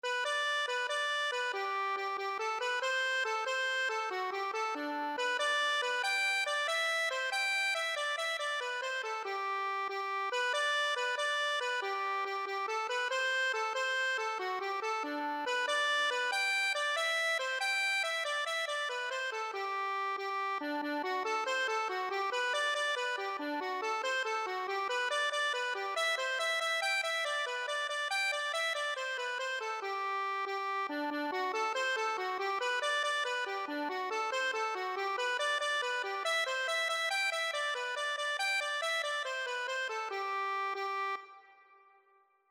kilkenny girl, accordion

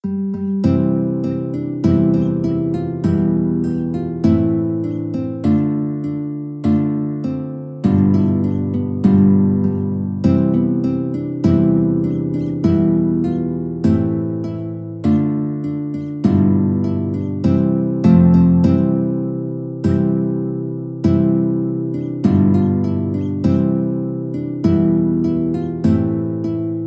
guitar duet

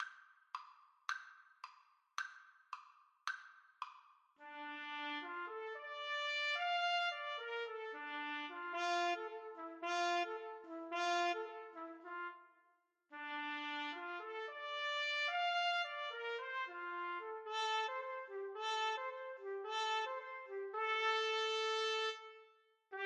Trumpet Duet

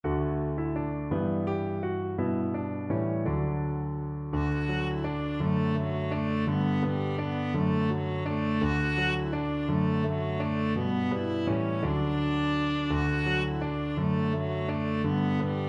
viola

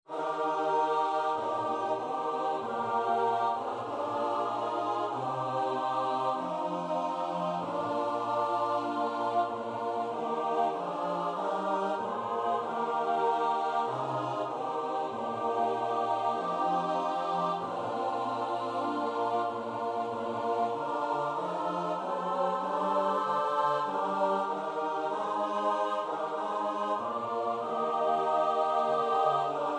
englelyd, choir

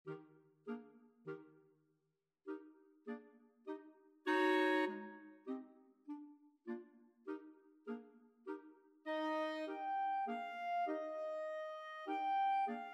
5 clarinet, clarinet choir

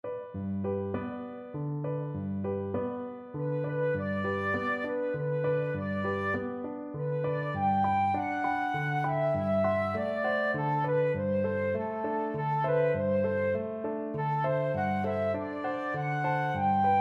lullaby, flute

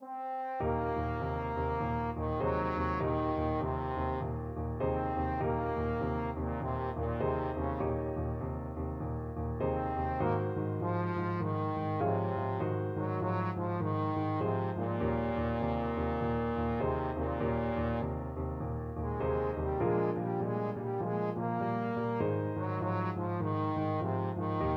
blues, trombone

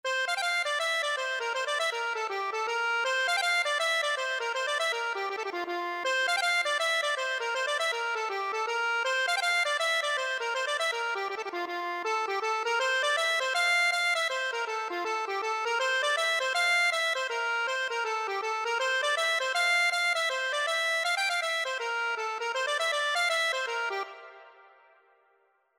accordion

